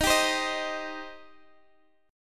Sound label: Eb 6th Eb, Guitar Standard; strummed; x,18,17,17,16,x